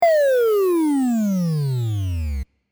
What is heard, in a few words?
pyuuuuu